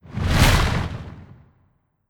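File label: Enchant Frozen Rose swoosh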